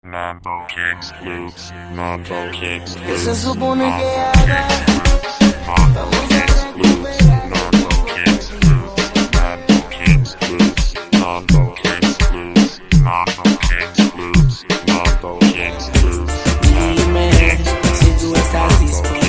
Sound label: Mambo Loops; Mambo Kingz